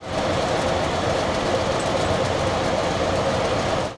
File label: mine lift; edgar